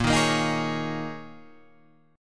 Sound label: G sharp Suspended 2nd Suspended 4th, guitar chord